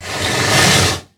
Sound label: snort